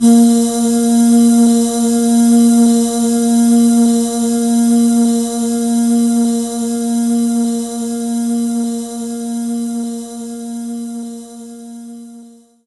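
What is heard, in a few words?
Synth Vox Aahs A sharp 3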